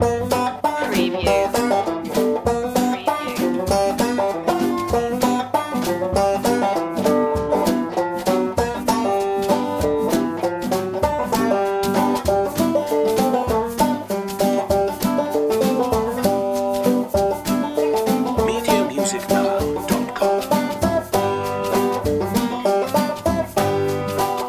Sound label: catfishin loop